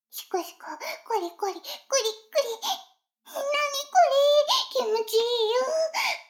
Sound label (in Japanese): シコシコ音声; 逆時間停止されて